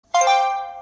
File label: Notification